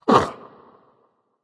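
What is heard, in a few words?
nonverbal surprised; smite; voicepack; merlin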